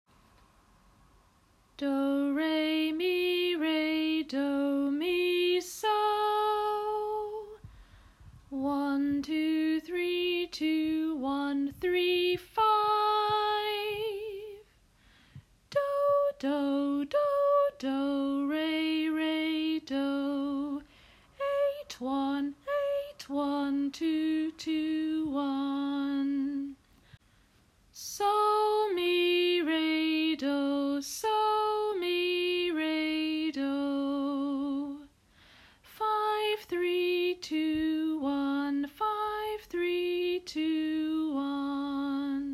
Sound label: Solfege learning; Years 3-4